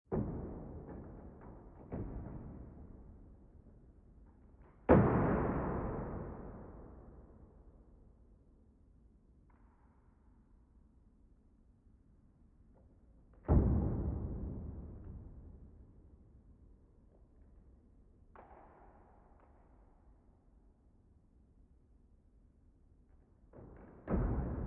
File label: Building Ambient Wood Creaks Eerie Presence; Creaking; Objects